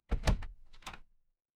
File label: door open